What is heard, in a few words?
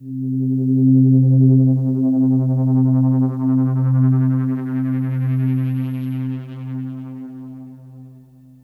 Synth hits and riffs